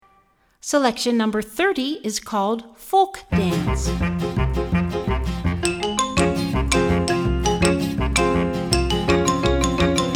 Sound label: Folk Dance